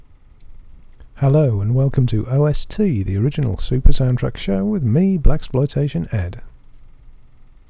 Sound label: with less tube